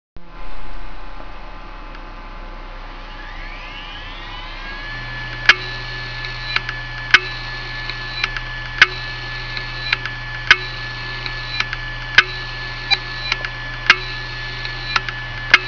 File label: ibm deskstar click of death, hard disk drive